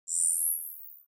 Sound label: ui classic zoom out light high